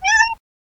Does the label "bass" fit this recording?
No